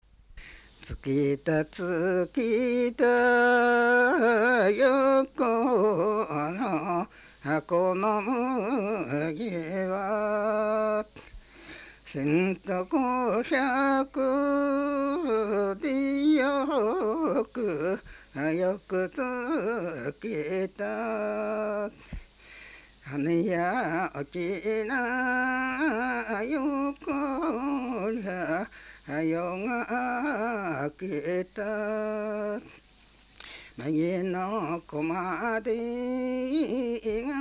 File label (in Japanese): mugitukiuta, minyou